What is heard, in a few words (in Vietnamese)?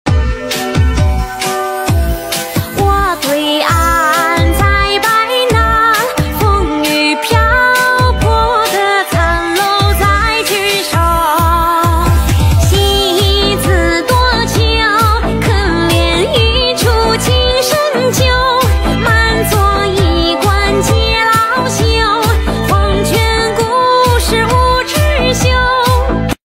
Nhac chuong tiktok cover